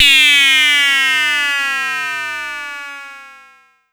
Pollard Syndrum

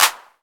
CLAP, HAND CLAP

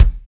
vex kick

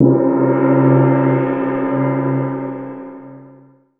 China, ChinesePrc; Akai MPC500

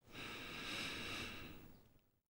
BRTH, BREATH FX, Jazz FX; Spectrasonics; Vocal Planet

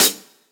Hat, Hi Hats; Zero-G Sound Sense Grime Scene; GS Kits

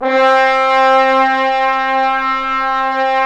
BRS TBNS, LOW BRASS; Roland - LA Composer vol. 1

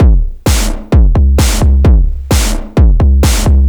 Beat Drive 130, distorted drum loops 130bpm; musicradar

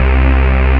BAS, Eurotech; Sounds Good

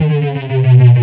FX, Futurist Drum & Bass

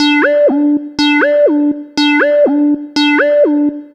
Analog Synth Pattern; Sonic Foundry (Sony Creative Software); Electronic Point Blank

